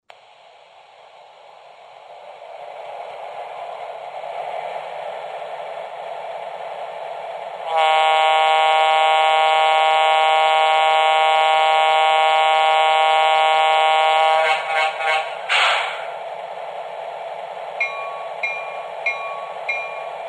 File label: sw1200